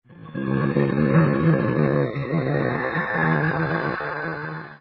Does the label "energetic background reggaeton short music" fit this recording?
No